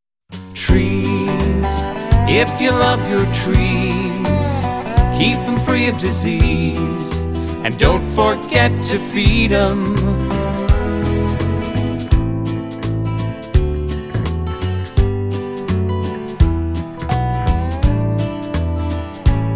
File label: ringtone jingle